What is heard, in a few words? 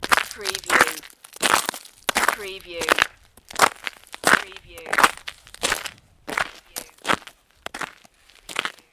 Snow and Ice, Footsteps ice